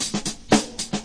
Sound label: 114, DRUM LOOPS and BREAKS